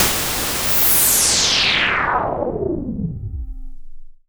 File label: Pulse, Filtered Noise